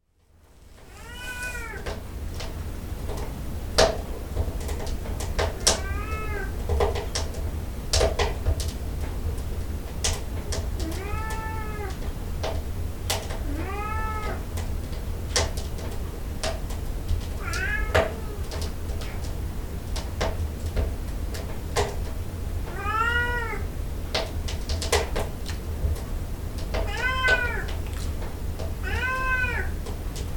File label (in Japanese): choushoku wo nedaru 18 sai no neko; 朝食をねだる18才の猫